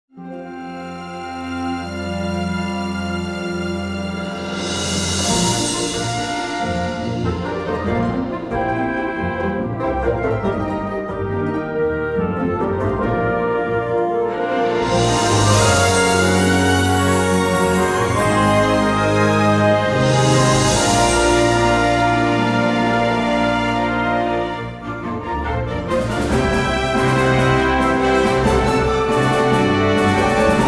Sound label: BATTLE FANTASY Music